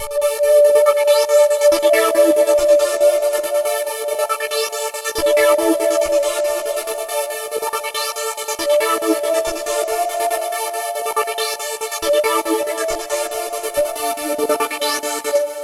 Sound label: rezloop; Monstersynth 3; Future Music